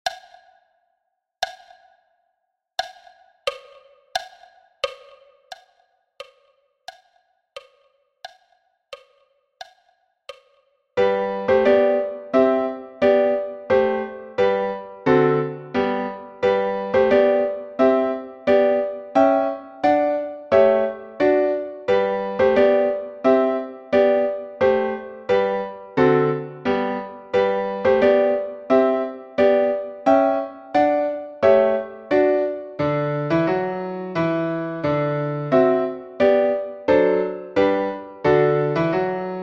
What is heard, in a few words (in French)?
La marche des soldats piano a 88 bpm